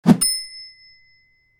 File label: Cheerful swipe transition; shorts sound effects